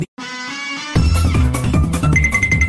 fast music

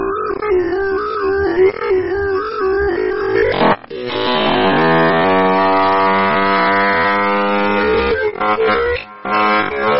long brain fart slowed

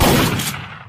shotgun firing

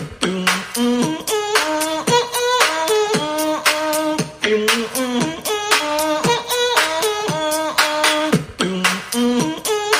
beatbox

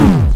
damage sound; undertale

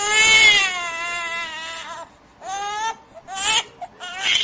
baby crying

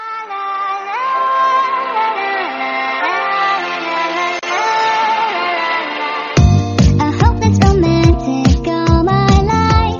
sped up

